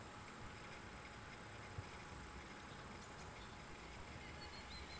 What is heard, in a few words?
silence; jvc gz-mg67e